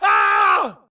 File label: grunt death crush; Titanfall 2